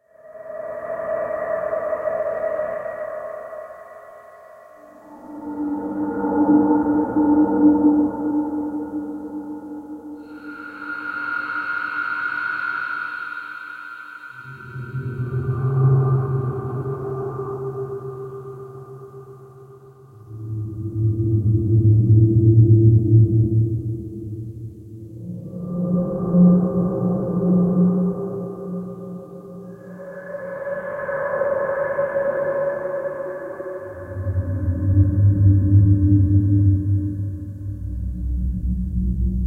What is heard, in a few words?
eerie; Golden Crayon Sounds